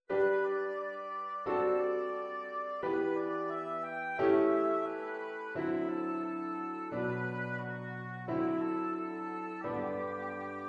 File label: oboe and Piano; To A Wild Rose